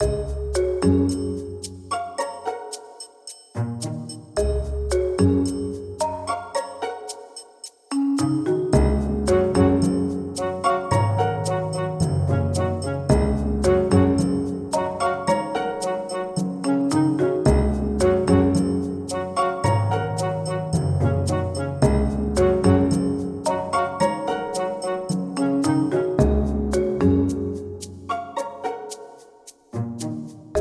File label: music